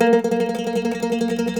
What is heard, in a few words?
MANDOLIN